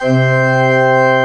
BP C3, ORGAN

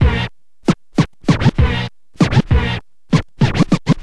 scratch short, Vinyl scratches

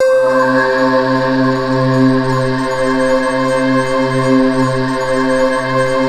NIGHT C4, NIGHTFALL; Sound Gallery - Charged

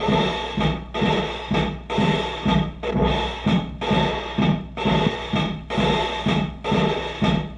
Chamberlin Rhythmate Loop, Drum Machines

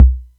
Atlantex Music Percussion Computer Kick

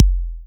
Drum Loop 128BPM BD C; Multi Drum Loop 07

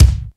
HEAVY KICK, Drum Machines, Akai MPC-3000

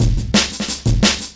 Breaks 175, Drum&Bass; EZRollers

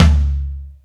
Bo Floor Tom, Tom One-Shots; KJ Sawka - Drum n Bass & Beyond; Drum Tool Box

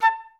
FLS STAC A4, Advanced Orchestra; Best Service; Peter Siedlaczek's Advanced Orchestra

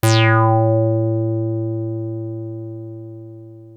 303 A2, TB 303

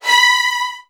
B3, Strings, VN 16TH; ILIO; Kirk Hunter

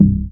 DM ORG1, Dance Mega Synth

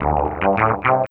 Fonk Line, Funk Keys; Sonic Foundry (Sony Creative Software); Synchro Funk

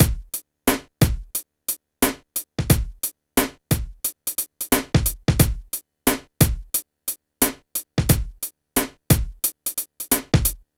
PHATT BEA

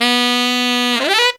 TENOR FX; Zero-G; Phantom Horns

